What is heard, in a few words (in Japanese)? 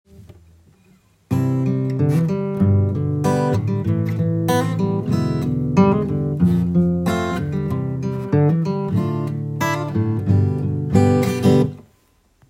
パターン2 e7